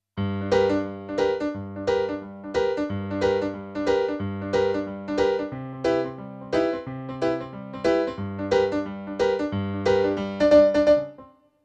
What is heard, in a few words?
Piano, RNR for piano